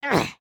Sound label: Run; Lego Strombie; creatures organic